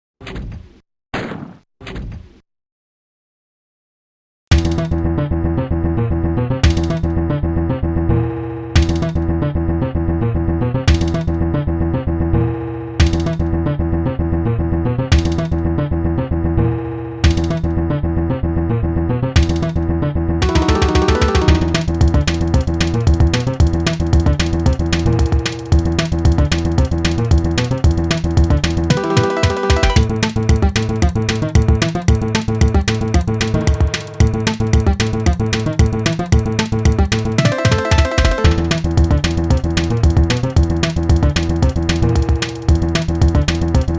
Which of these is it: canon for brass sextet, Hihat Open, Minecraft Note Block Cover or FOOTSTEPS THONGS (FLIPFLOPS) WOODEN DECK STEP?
Minecraft Note Block Cover